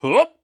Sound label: yelp